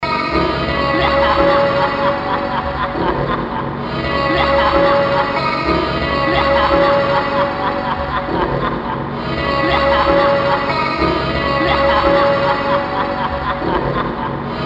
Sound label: Nutter Pad; Industrial